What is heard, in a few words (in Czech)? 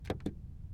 Autoradio Tlacitko